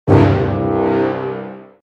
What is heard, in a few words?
war declared sound effect; euiv